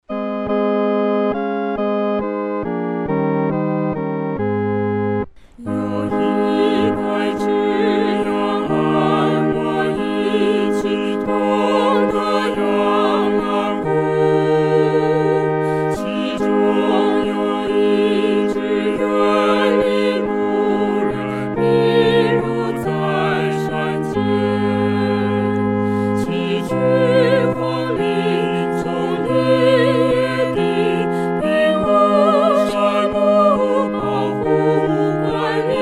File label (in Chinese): 合唱 四声部